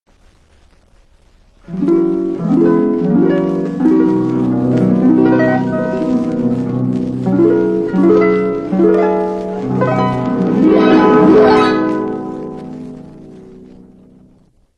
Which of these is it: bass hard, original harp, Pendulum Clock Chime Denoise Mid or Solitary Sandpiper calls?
original harp